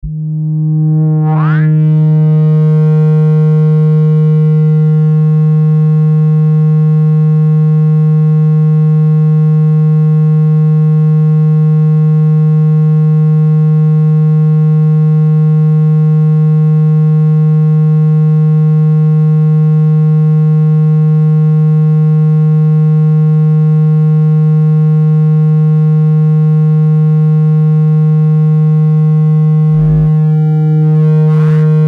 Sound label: Sound To Remove Water